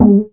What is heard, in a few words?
Synth Shot G#; Mutekki Media; Vengeance Electroshock Vol.2